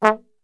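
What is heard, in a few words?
A 1 trombone shot, Brass; Best Service; Brass Super Section; TRMB SHOT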